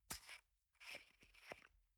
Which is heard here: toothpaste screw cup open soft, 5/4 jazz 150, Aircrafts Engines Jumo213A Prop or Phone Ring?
toothpaste screw cup open soft